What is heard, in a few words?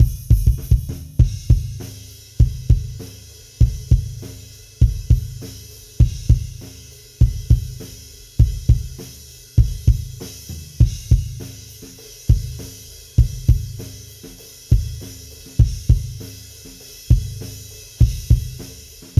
Kick Mic Filter OFF Setting